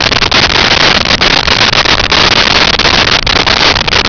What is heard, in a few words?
amb lava flow loop